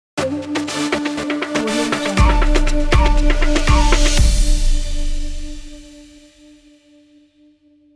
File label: arabic logo